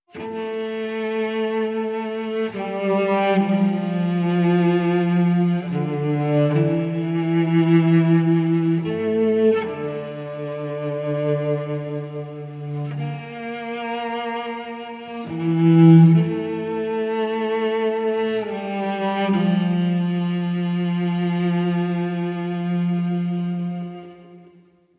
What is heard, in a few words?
Cello